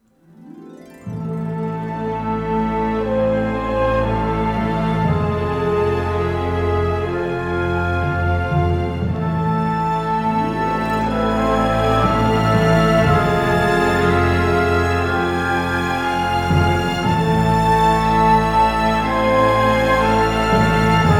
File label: strings